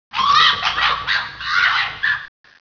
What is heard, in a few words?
animal runs